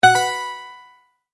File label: multimedia tone correct